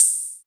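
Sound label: CR-78 open hi hat; Hi Hats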